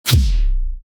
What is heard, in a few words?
fast bass sword hit; combat